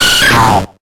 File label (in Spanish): Grito de Hitmontop